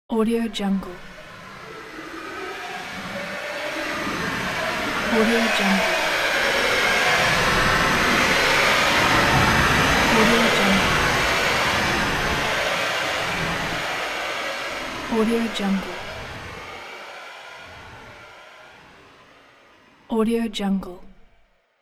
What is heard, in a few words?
transition fearful time